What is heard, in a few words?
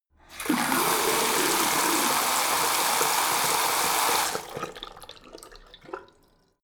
tap flow hard short; haus garten; badezimmer